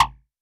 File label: Tempest Analog Snare, Drum Machines; 300 Drum Machines; Dave Smith Instruments